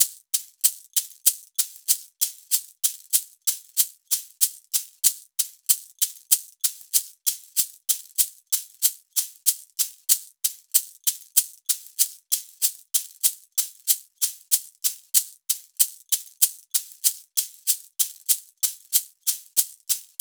shaker 095; 095bpm; Hip Hop India Modern Percussion

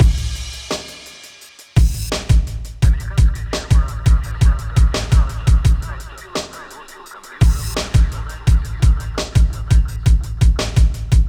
Drums FX Combo, 085 Amin

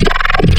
Thin Growl, wavetables; Cymatics; Essentials Wavetables; Serum

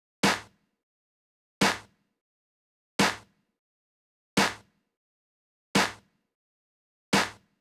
Snare; big fish audio; Hip Hop Exotica; Apple Loops

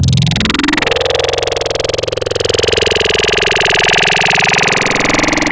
Growl Table, Serum; Cymatics

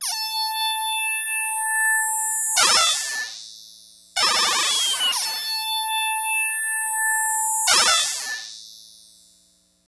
Synth

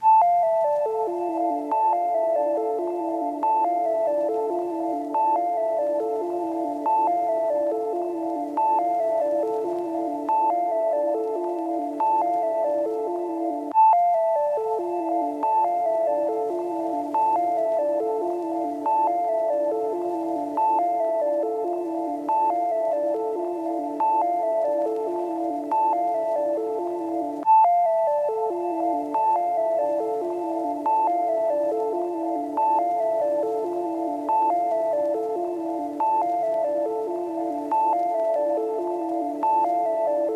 140 BPM D Min Arp; Cymatics; Destiny Gold Expansion Stems; Last Flight

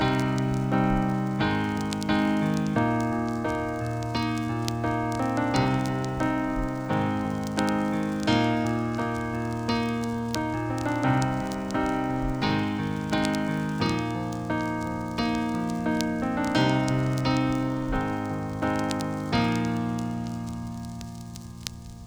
Full Chords, bpm 87; hiphop; StudioLinkedVST Vinyl Touch; Progression 31

